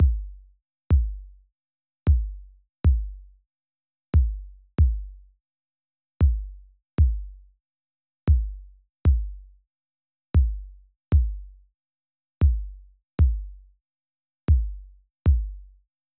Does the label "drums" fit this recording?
Yes